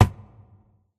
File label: phys cube, physics